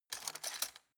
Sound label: cutlery plastic drawer grab